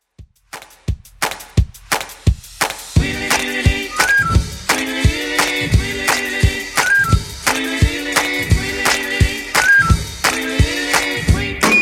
50s Redrum 173bpm

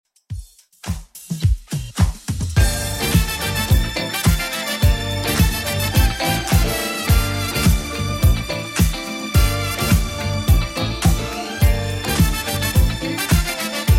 80s Redrum 106bpm

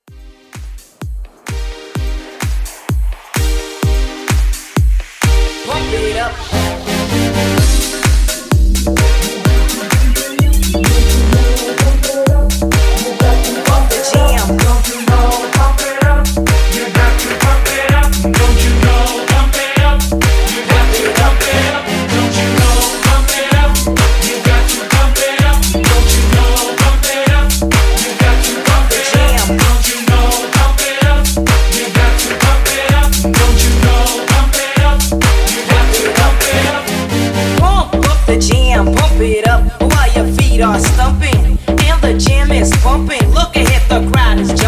House Mixshow, 128

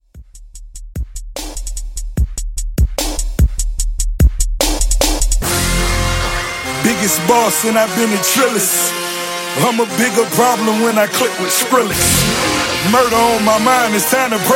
Dubstep Trap Redrum; Remixfreakz

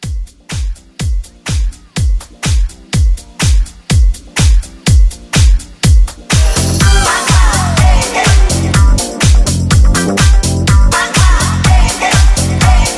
Disco Medley

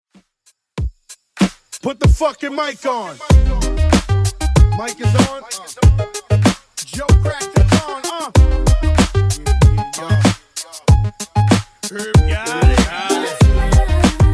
Rnb DJ Friendly Redrum 95bpm

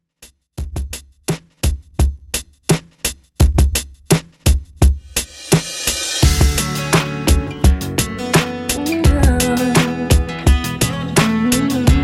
Pop, 85Bpm; Redrum

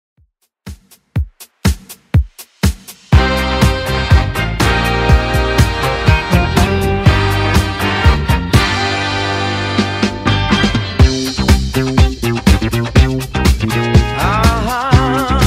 70s redrum 122 bpm

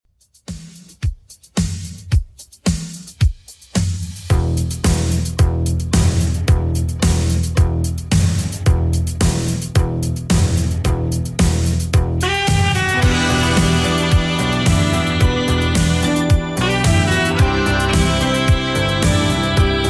80s Redrum 110 bpm

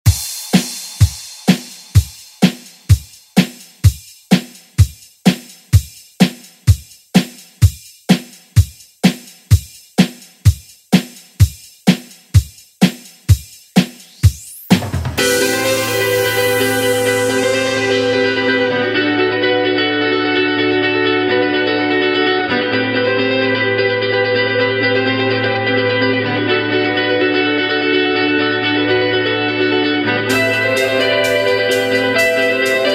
Re Drum; DJ Mhark

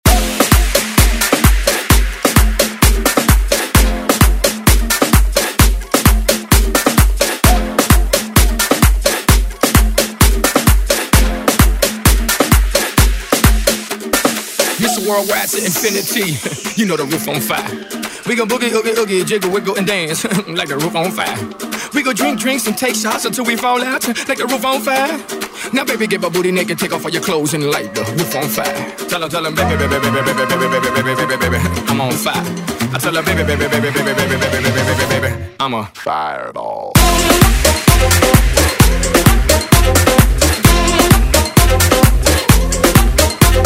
Tribal House; Redrum

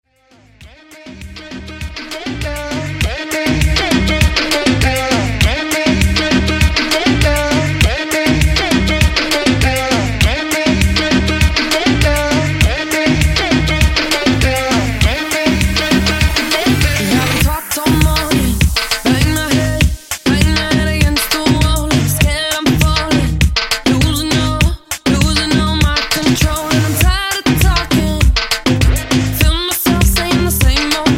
Moombahton 100 Bpm; Redrum

